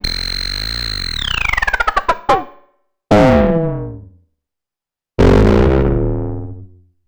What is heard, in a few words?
modular synth steamed up